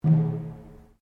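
Drum Taiko Cylinder Rope piano, Japanese cylinder taiko; Miscellaneous Musical Sound Effects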